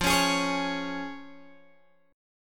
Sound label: F Minor Sharp 5th Guitar DADGAD; Fm#5; 15,16,18,18,16,x; strummed